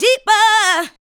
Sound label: ADLIBS